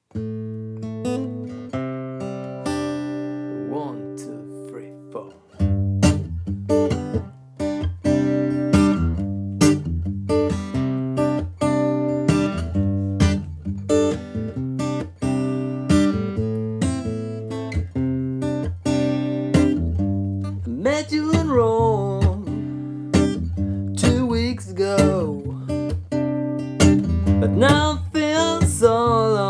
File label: Acoustic Version